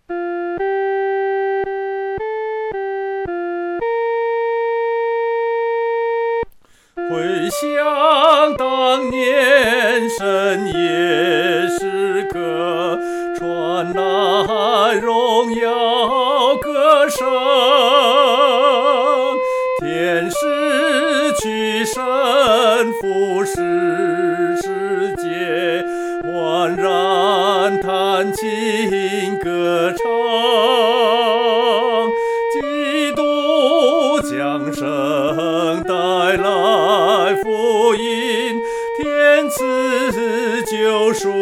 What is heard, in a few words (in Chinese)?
独唱 第一声